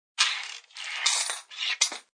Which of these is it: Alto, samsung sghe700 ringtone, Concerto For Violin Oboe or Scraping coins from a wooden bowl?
Scraping coins from a wooden bowl